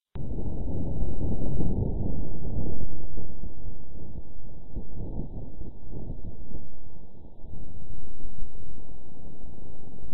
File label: sounds from insights pressure sensor on mars; nasa